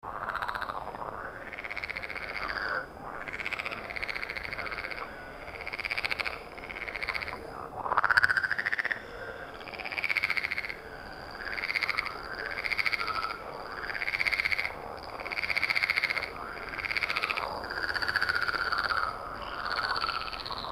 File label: a frog croaks